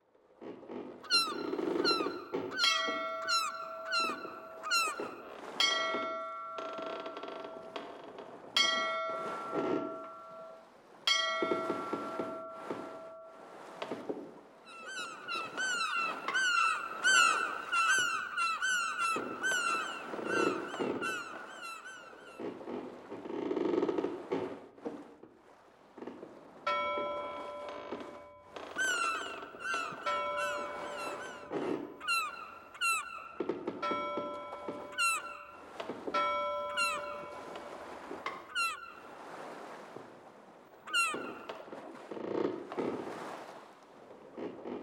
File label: seamap ambience